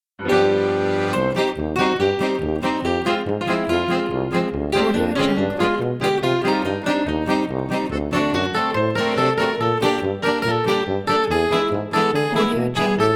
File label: festive dance